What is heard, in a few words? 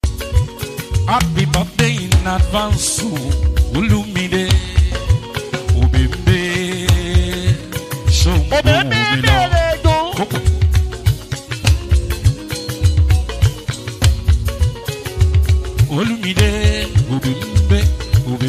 Ambiance Lifted Night